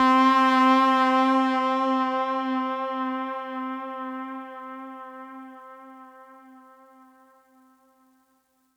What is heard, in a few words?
Synth C, Synth Prophet One Shots; Electronisounds